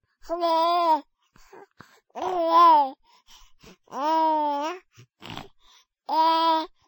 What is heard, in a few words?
chuckle